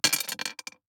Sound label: DICE on Glass, Throw and Roll, Standard, Two Dice; Samples; ArticulatedSounds; DICE Sfx Party (Extended)